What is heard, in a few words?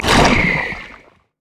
elastic slingshot; Zac